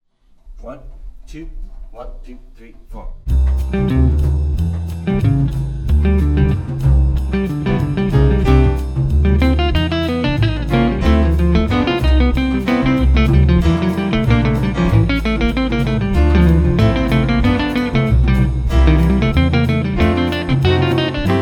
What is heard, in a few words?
latin etude rhythm section with guitar solo